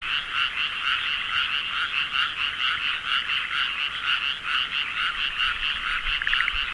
frog ama

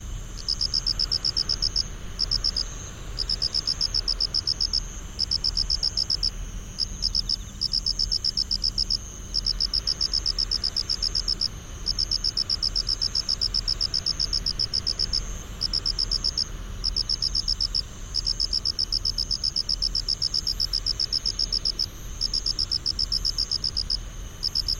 haraokamekoorogi

